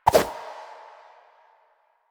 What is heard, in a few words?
Run Whoosh, Footsteps; Enemies; Soldiers; Warframe; Lasrian; Butcher